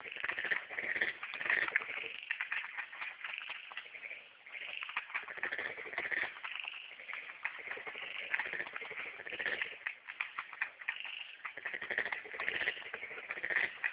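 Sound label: frog, Beijing Street Sounds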